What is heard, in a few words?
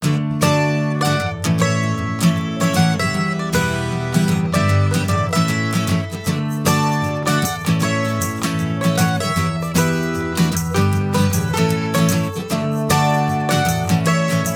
Guitar